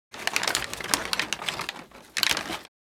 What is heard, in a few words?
Loop paper handling